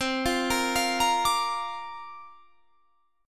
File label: C 9th Suspended 4th C down